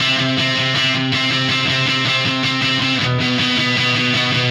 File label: Happy Pub Guitar